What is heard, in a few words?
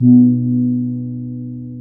KEMPUL, Bronze Gamelan; E-MU Formula 4000 Series Vol. 4; Earth Tones